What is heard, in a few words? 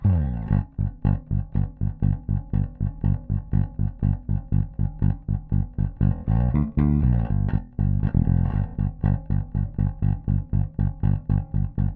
jam bass; king koa; nordys